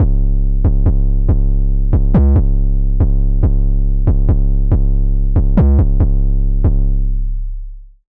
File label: 808, Spire; City of Men; Routed Sound; Spire Expansion; Street Vanquisher Vol.1